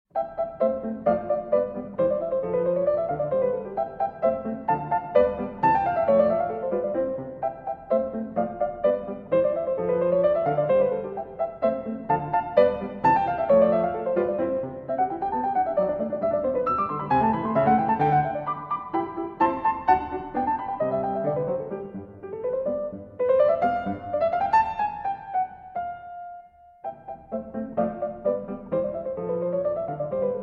Piano Sonata No. 16 in C Major III. Rondo. Allegretto